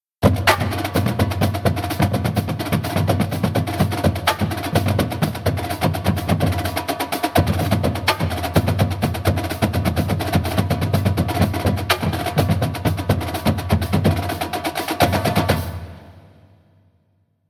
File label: Drumline; Spyder Drumline